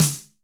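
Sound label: snare, funk kits; Crypton - DrumBase5000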